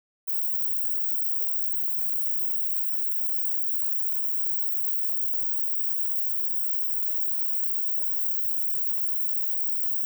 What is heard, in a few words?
20kHz; dbx test